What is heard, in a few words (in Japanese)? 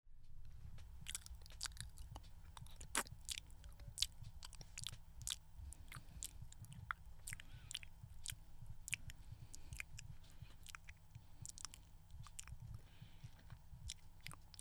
オナニー実演生くちゅ音; オナニー実演音声